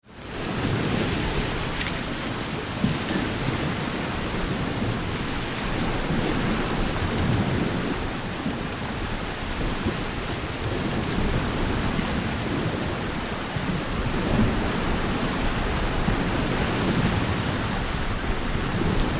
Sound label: Galleon Tall Ship Under Sail Interior or Exterior; Creaks and sea